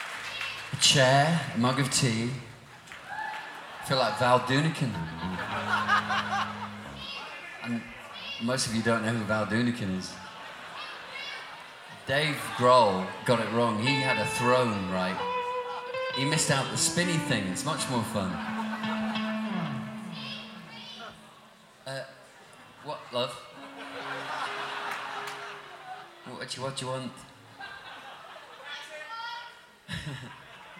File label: crowd